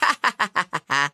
Laughing; Devour

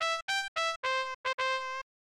Tile Match Jingle; Shanghai 2 Dragon's Eye; snes

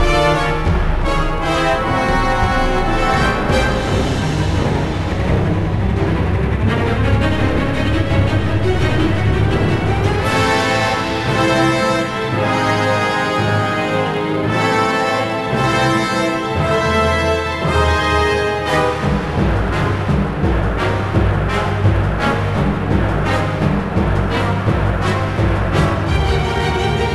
Music Combat; game rip; Legend of the Guardians The Owls of Ga'Hoole; nds

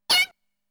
Hit; Namco Game Sound Express Vol.1; Valkyrie no Densetsu

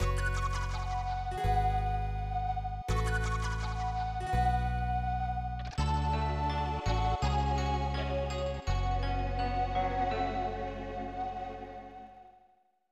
Lose; Super Shougi 2 1994 SNES

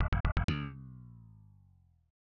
Tile Match Rhythm; Shanghai 2 Dragon's Eye; snes

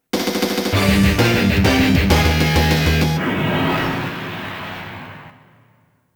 CLEAR (Clear BGM); the king of fighters 94

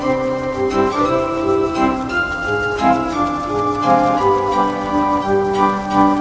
Carousel; My Tamagotchi Forever; android; GSFX Carousel Kuchi

